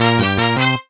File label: Funky short; Nokia; 9210 CD